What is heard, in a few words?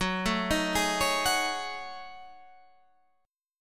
F sharp Minor 6th Add 9th, Guitar